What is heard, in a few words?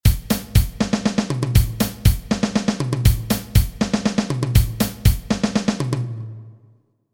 beat